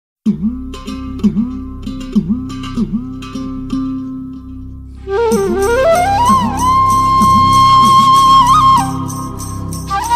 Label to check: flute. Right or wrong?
Right